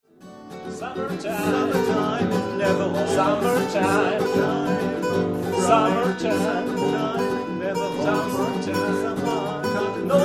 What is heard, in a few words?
Trio Impro